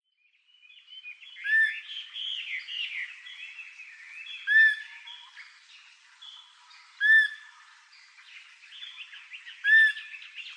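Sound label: Pallas's squirrel; miurashi; koe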